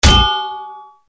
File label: axe heavy stone; conan